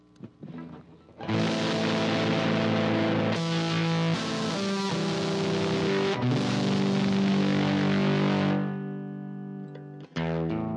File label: fuzz